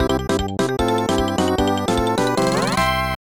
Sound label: Stage Clear